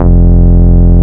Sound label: Root F, Bass